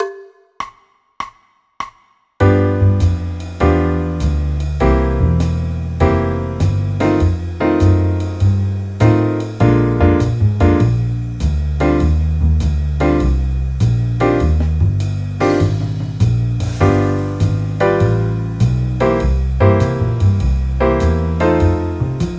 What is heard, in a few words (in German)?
eb dur akkordverbindungen